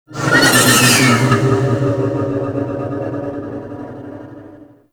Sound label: Fly By Quick; Vehicles; Warframe; Liset; Liset Flight; Nora Liset